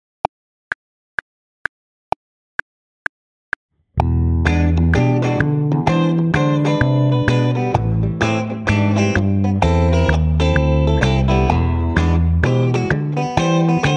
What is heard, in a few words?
Improv 8 Bar Jazz Groove Based